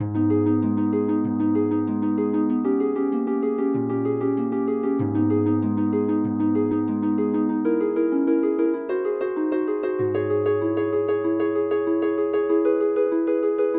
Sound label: in c minor for harp; giuliani mauro; allegra